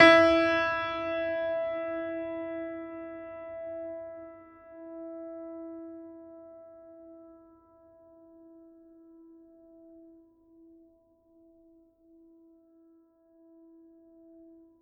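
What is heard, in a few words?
Classical Piano E3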